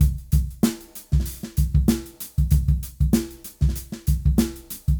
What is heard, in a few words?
drumloop 2bar 96; Imagination Rising